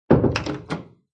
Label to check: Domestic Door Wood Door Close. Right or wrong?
Right